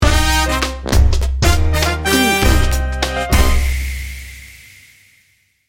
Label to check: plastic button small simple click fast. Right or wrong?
Wrong